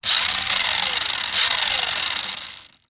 leisure toy rc car motor drive x2 short bursts wheels spin air upside down; zapsplat